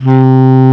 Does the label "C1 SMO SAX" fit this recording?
Yes